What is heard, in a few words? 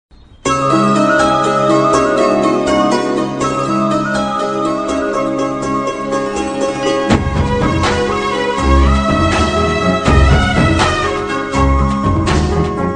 trending bgm ringtones kannada ringtone telugu ringtone tamil ringtone